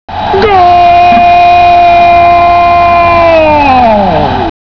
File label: goal